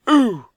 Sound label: male NPC damage; server-nalc; mobsounds